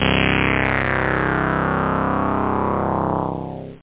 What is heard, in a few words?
analog syn; OctaMED SoundStudio V1; jazzies samples